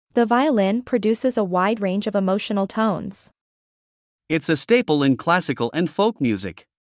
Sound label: Violin